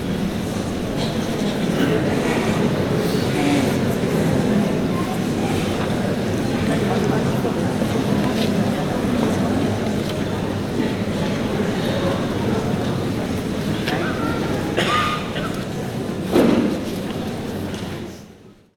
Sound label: church crowd; best service Blue Box